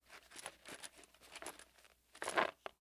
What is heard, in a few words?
colt45 saa cartridge box; prosoundfx